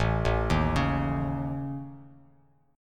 A Major Flat 5th, Bass Standard; arpeggiated

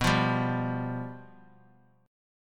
F Suspended 2nd Flat 5th B

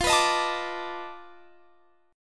B 7th Sharp 9th Flat 5th, guitar

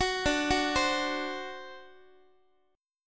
D 7th, D, down, Guitar; 4A,4E,5A,5D,5G#,6C#; arpeggiated; JGuitar

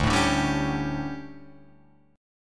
D Major 7th Suspended 4th Guitar Drop D, chord

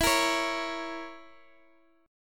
Bb Diminished E

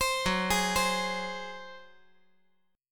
F sharp Suspended 2nd Flat 5th F sharp, Chord; arpeggiated; JGuitar

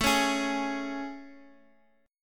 A Major 7th A, Guitar, Open F; AM7 strummed; x,x,x,4,4,3; JGuitar

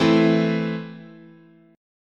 E Suspended 4th Sharp 5th, chord

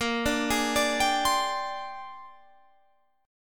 Bb 13th, Guitar, Standard; Bb13 arpeggiated

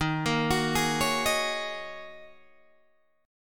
D sharp Minor 11th D sharp Guitar Open G, chord

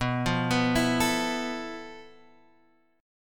F Major 7th Flat 5th B, guitar chord

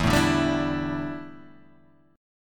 D Suspended 2nd Suspended 4th E

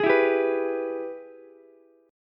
Ab Minor Major 7th Sharp 5th chord, Ukulele; strummed; JGuitar

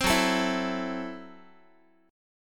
Gb Minor 7th Flat 5th, Guitar; strummed; JGuitar

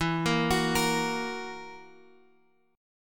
E Suspended 2nd Flat 5th E down Guitar Drop D

